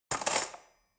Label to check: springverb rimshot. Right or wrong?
Wrong